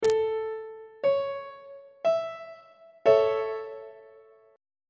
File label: a major chord on treble clef